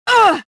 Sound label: pain; tavion